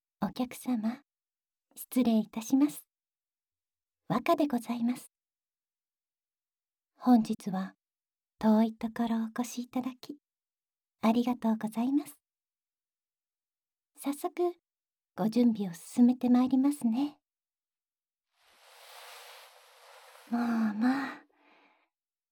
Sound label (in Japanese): 火鉢とつげ櫛や耳かき, ASMR